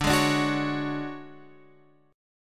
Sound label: D Major 7th Flat 5th D down Guitar Open F; strummed; JGuitar